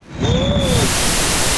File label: flare loop; weapons; dota; rattletrap